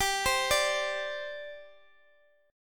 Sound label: G Suspended 4th, Guitar, Open D